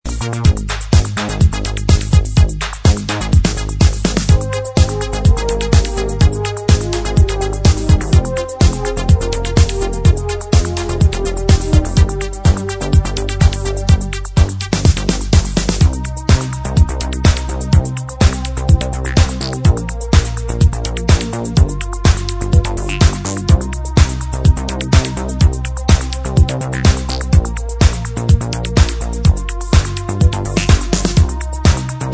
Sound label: fading away (instrumental)